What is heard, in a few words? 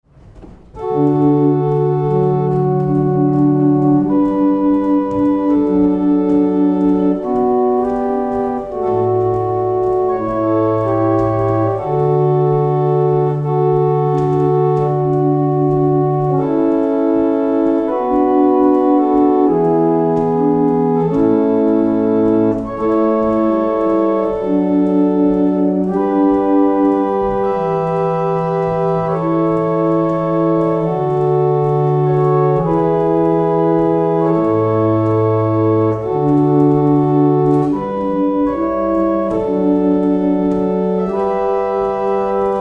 barrel organ; Letheringsett